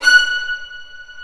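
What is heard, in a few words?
Vio spiccato F#5; Digital Sound Factory Virtuoso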